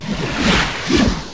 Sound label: fireball sound; caccodemon